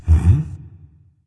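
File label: non verbal thinking, voice; cernunnos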